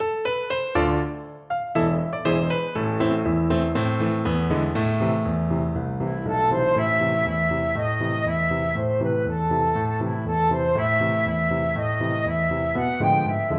flute

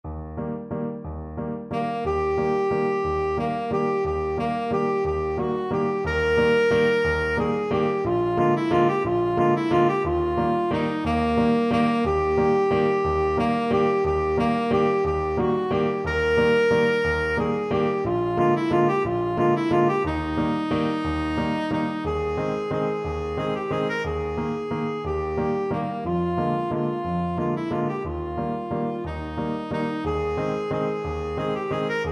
tenor saxophone

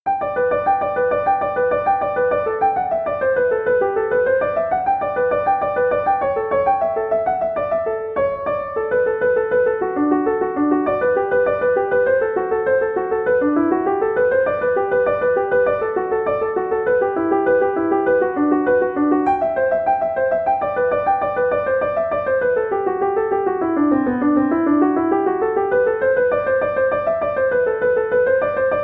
handel queen of sheeba, keyboard

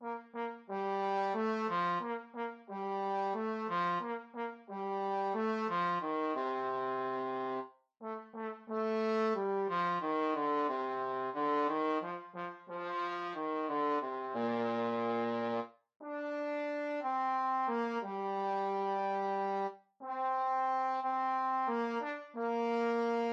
trombone

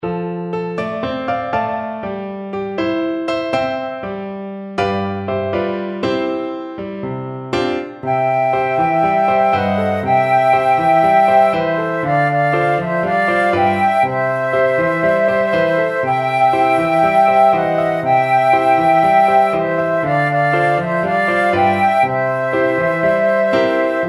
yellow bird flute flute

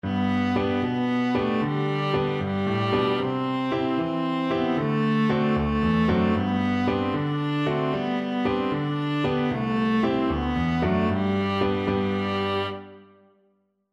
oats peas, viola

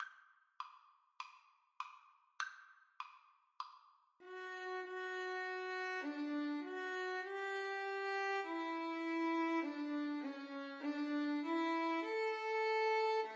violin duet

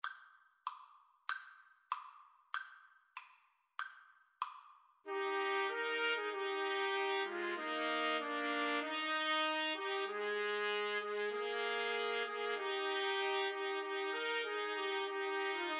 Trumpet Trio

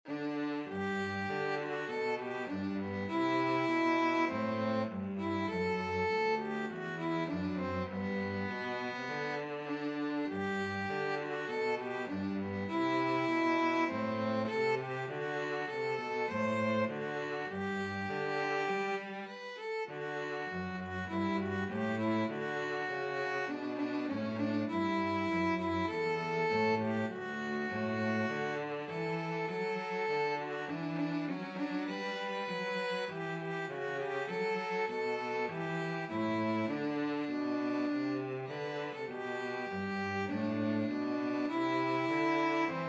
violin cello duet

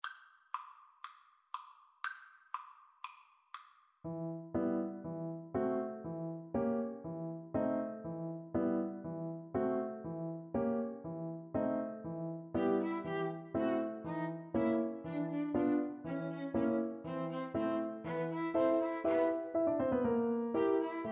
Piano Trio

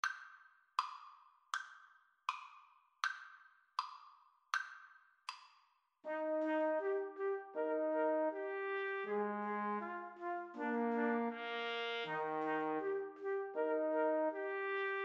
2 Trumpets Trombone

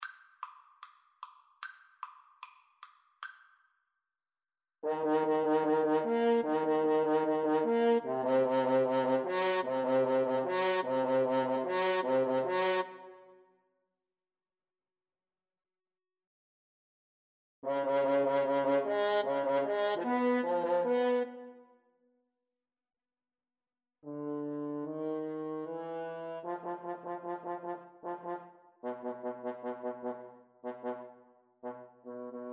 French Horn Duet

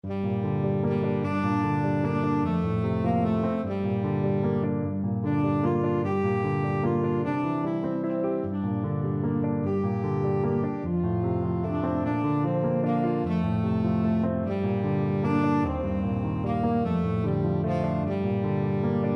tenor saxophone

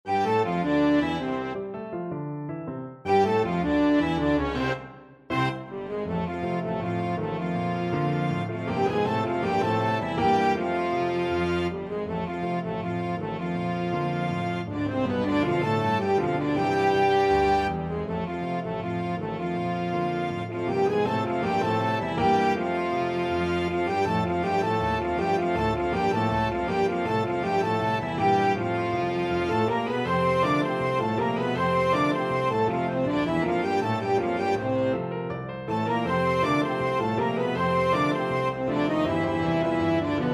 flexible unison

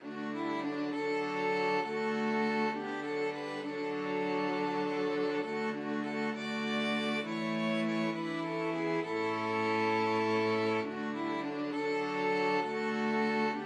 blessed assurance, string quartet

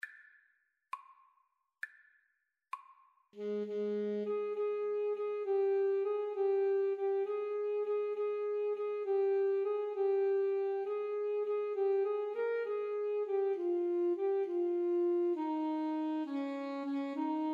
Alto Saxophone Duet

